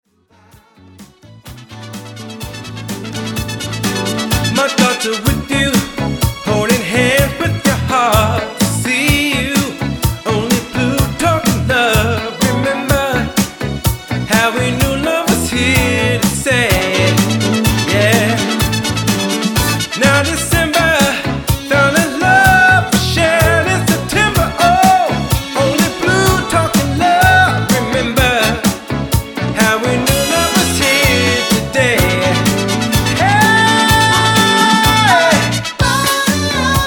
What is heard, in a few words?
solo piano with fade